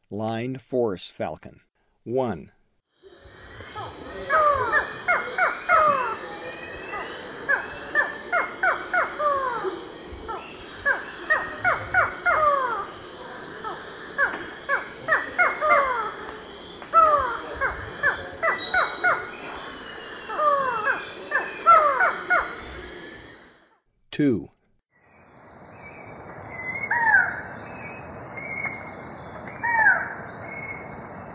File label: Lined Forest-Falcon; S. America; Eastern Lowlands